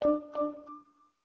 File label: remind tone; HTC AT&T Tilt 2